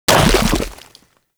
Mech Step Surface Goo; Vehicles; Step Surfaces; Warframe; Nechro Mech

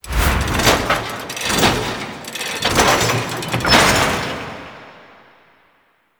Motorcycle Customize Out; Interior; Ambience; Warframe; Nineteen Ninety Nine; Tailor Shop

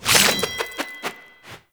Infested Boomerang Idle Spin; Weapons

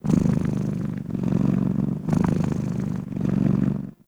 Kitten Purr; Warframe; Catbrow

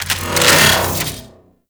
Sword Shield Equip; Warframe; Weapons; Archwing; Melee

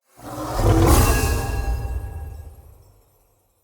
stag wild exclaim cast; Powers; Stag Pact

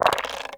Dice Roll One; Warframe; Koumei

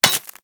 Laser Impact Metal; Warframe

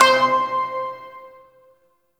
Shawzin Oct Three C; Warframe

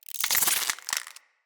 Root Spider Foley Spin; Animals

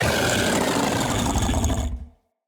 Kubrow Feral Vocal Angry

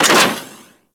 Target Arm Stop; Venus Open World; Objective Rooms

